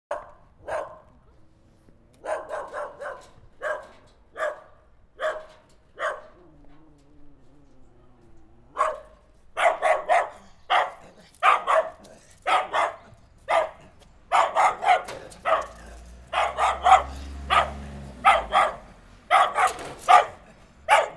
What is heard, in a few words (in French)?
chien aboie